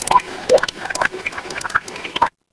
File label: water drip